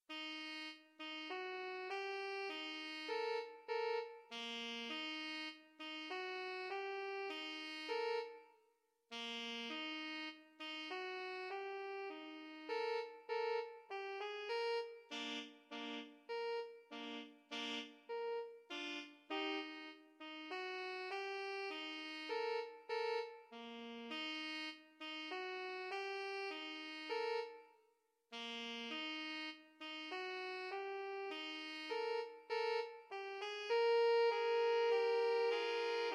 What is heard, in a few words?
sneaky saxes, saxophone trio